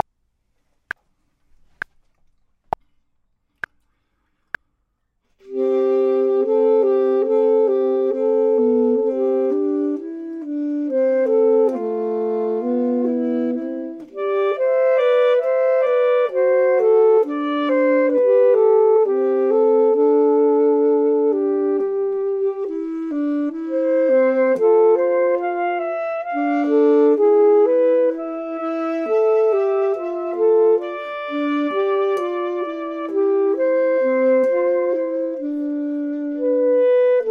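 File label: both, Saxophone and Clarinet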